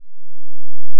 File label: sin wave